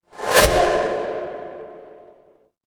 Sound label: transition whoosh